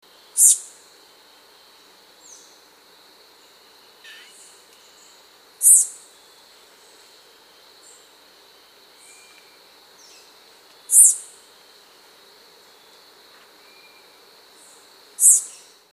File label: Silver-throated Tanager